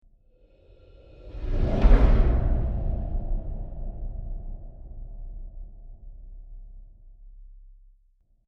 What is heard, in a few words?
Trailer Whoosh Deep Impact, Whoosh Deep Impact Horror Airy Breathy; Evil Scary Ominous; Trailers (Sound Effects:Sound Design)